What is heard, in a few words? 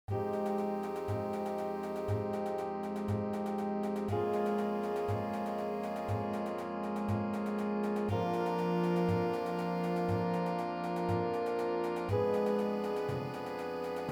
Thriller